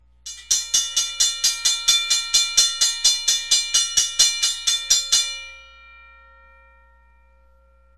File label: Bells Mechanical; Safetran